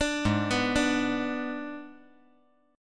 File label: Ab Diminished Ab, 5G, 4C, 5E, 5A